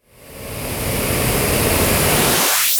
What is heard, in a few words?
FX Sweep Up